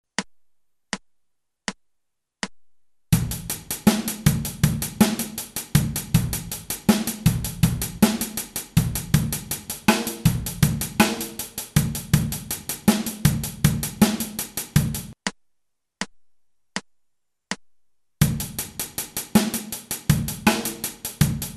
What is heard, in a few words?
backbeat 16